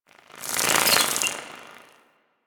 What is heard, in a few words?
Winged Insect Passby; Warframe; The New War; Narmer Outpost